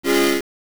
Accordian; Genesis

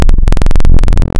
Classic Triangle, wavetables; Architecture 32

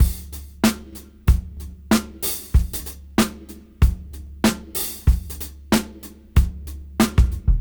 Funk 94 BPM; AMG - Keith LeBlanc Phat Butt Jams

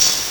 Drumul, Nintendo Gameboy-LSDJ; 300 Drum Machines

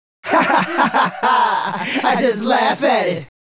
Male Group, Hip-Hop and RnB Vocals

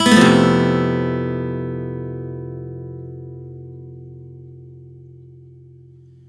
F# maj7 #11 upstroke, Acoustic Guitar; Sonic Foundry (Sony Creative Software); Songwriter Acoustic Guitar Companion; One Shot Chords

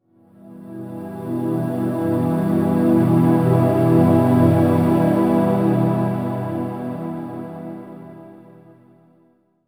Vox Orch Chords; Drones Abstract

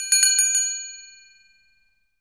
brass bell; ILIO; Synclavier Percussion 2; World & Orchestral

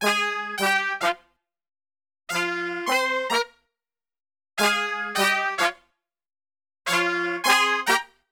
POP FM-EB, Brass; Best Service; Brass Super Section; POP LICK

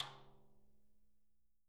STKRIM R, Living Drums

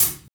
HAT; East West; Funky Ass Loops

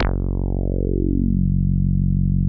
TB 303 BASS

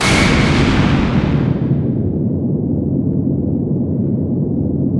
IGNITE, WARHEADS; Spectrasonic Distorted Reality 1; WARHEADS 2

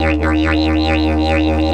140, DIDGE RIFFS

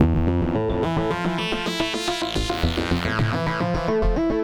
NRG Arp, trance

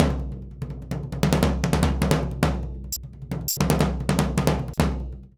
SURDO, Modern Percussion Loops; Soundscan vol.56; AKAI; SURDO 100

